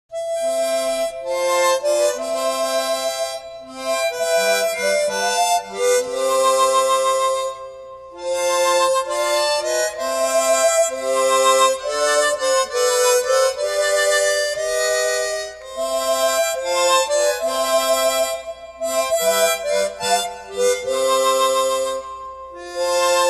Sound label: Cleanse Me Chromatic Harmonica